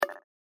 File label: start button; Super For